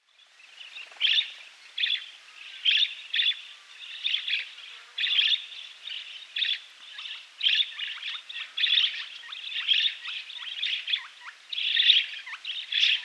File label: Budgerigar song call voice sound